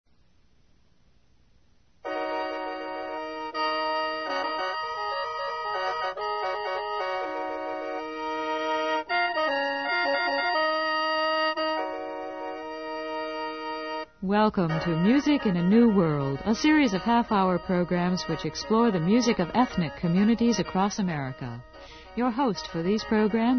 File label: Samoan